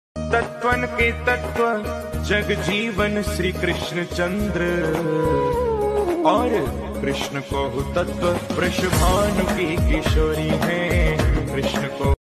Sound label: song female version